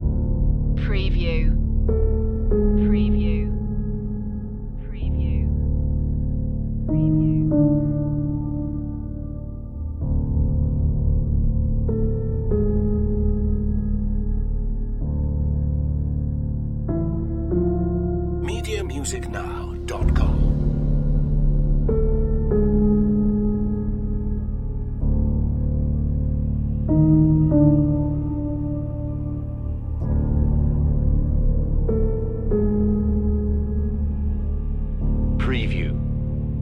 when the lights are out loop, agony

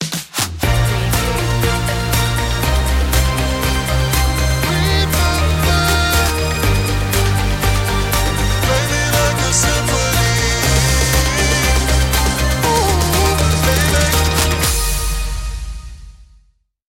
feelgood pop

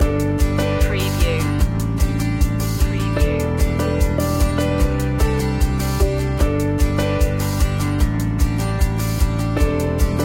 start new day loop, Country Ballad

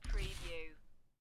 Poker Chips Grab Stack Of Chips From Table

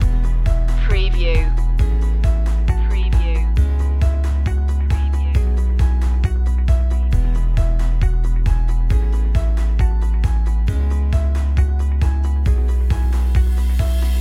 upbeat impact loop

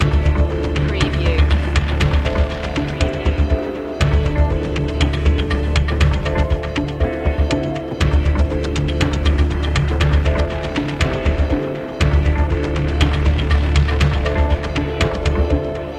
incoming menace loop; ambient; dark atmospheric

